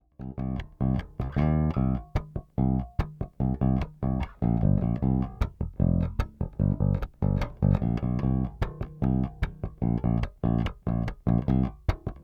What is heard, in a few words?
Both Pickups, Bass; SkarBassOne